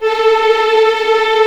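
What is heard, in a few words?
A4 TREM F VL, violins tremolo; East West; The Ultimate String Collection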